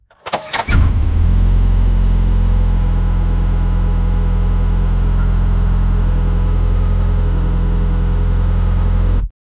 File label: porsche cold start engine